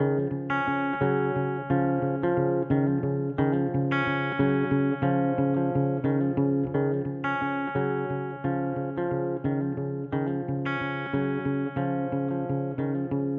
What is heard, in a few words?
Instrumental; Rhythm Doctor OST